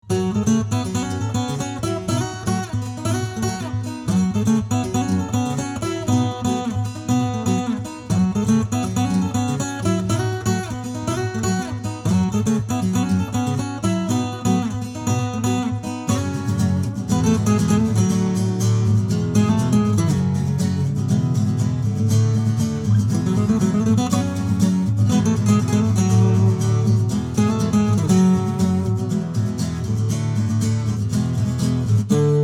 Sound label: Acoustic; Startropics Dungeon